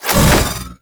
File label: Ability Throw; Warframe; Trapper